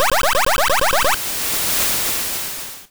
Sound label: Mega Evolution, Battle Effects; P3D-Legacy